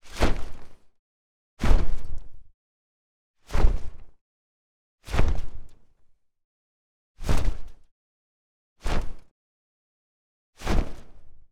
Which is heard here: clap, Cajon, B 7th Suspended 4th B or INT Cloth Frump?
INT Cloth Frump